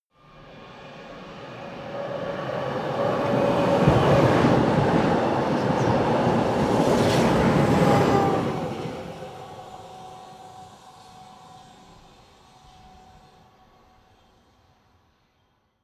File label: train electric drive by